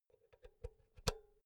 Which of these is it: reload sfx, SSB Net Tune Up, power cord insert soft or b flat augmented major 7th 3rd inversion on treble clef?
power cord insert soft